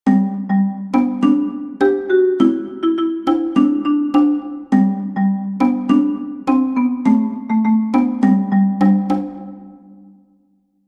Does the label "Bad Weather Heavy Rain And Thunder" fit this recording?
No